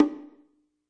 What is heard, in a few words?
classic hh Bngo; STYLE KITS (Hip Hop, Etc); Classic Hip Hop Mega Kit